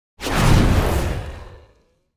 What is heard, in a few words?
Dragon fire breath short